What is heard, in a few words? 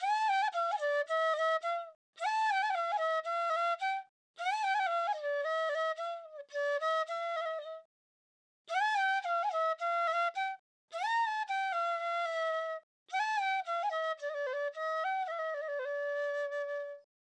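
Flute F major 110 BPM, Dancehall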